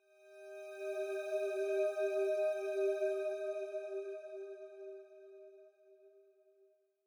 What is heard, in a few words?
Calm Pad; Super Mario Maker 2